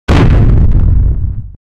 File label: a space ship hitting an